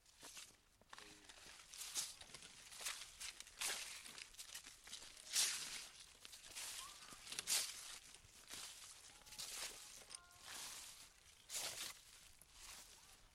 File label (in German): Schritte im Laub; Schüler-Podcast; Klosterbergschule; Klangexperimenten